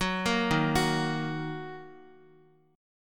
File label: Eb Minor Eb Guitar 4E 4A 5D 5G 5C 6F; Ebm arpeggiated; JGuitar